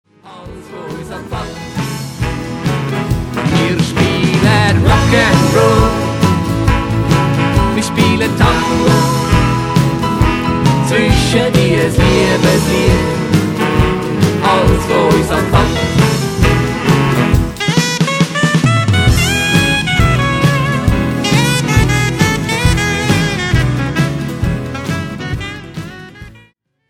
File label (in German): Rock'n roll und Tango